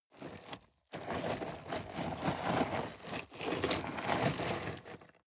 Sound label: scrape rocks soft